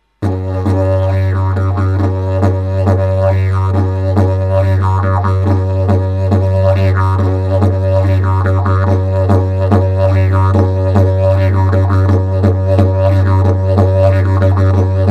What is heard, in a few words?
tomtom tomtomtom